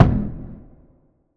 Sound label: Impact Boom Thud; bottlerocket